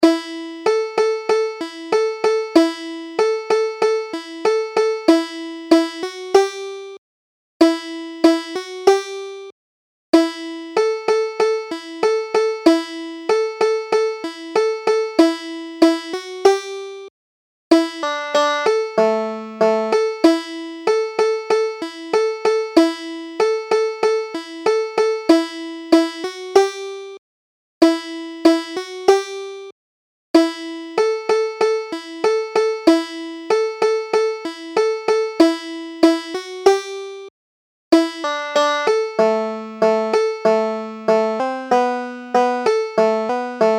hobarts transformation, banjo

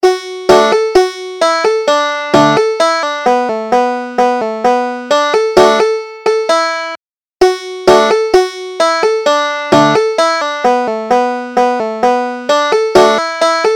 banjo

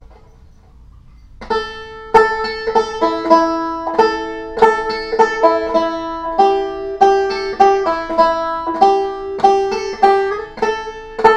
june apple, banjo

